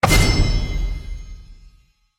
ui your turn; jade; champ select

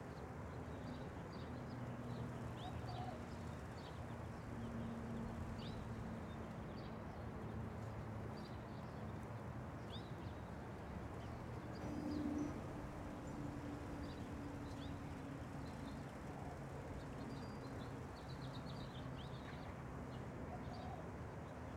amb end

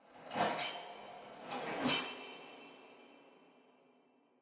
walk handstand loop

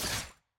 hit; samira

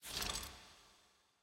ui main act right click

